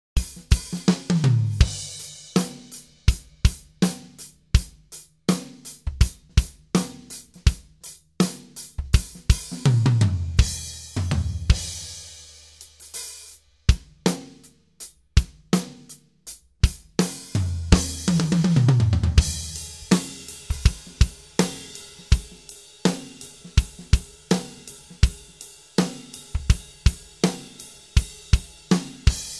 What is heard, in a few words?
Drum Sounds Example